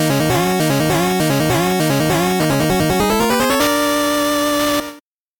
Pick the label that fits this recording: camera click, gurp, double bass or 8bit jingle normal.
8bit jingle normal